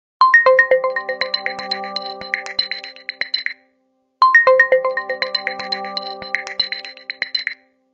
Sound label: Mini Mobile Sms Ringtone